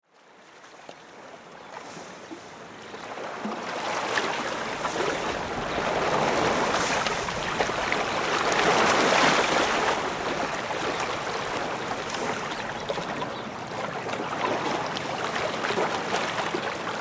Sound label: Ambience Lake Shore Windy Day; Nature